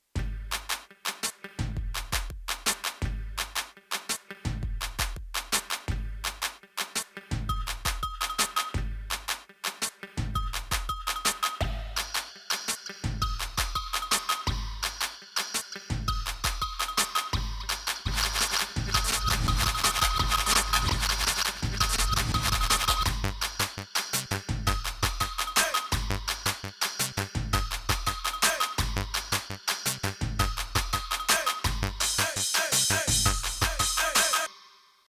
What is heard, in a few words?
RAGGAE ish; AUSTIN Studio